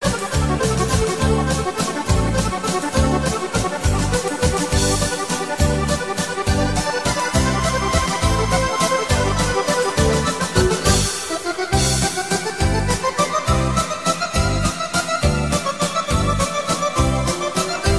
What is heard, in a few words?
Accordion